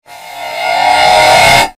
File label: jumpscare sound; five nights at zedbliys 2